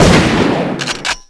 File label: gunshot